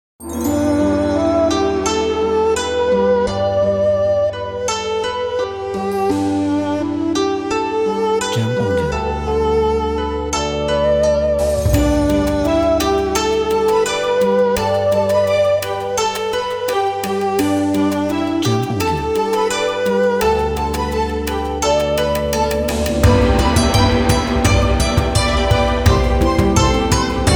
Moon Festival Chinese

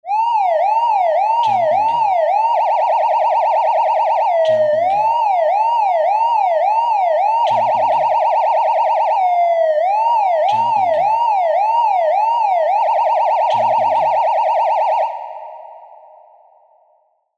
Car Signalization

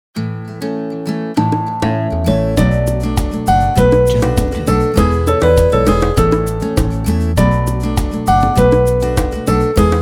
Kids Happy Logo

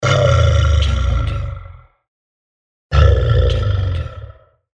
Predator Growl

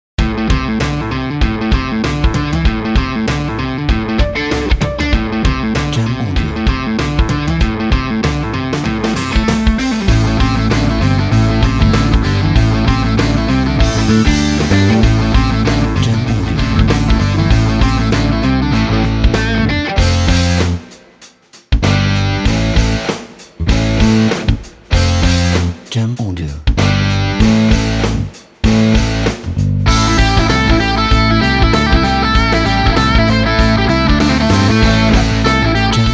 Rock Powerful

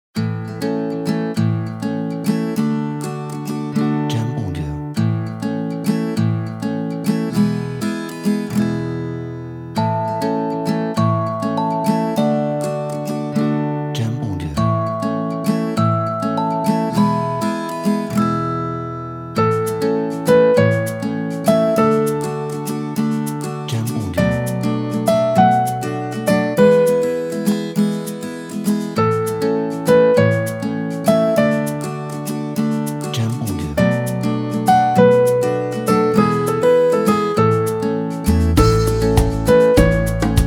Acoustic Positive Kids

